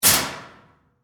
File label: Locker Close, Sports, Locker, Door, Close; Slam, Locker, Room; Miscellaneous Sport Sound Effects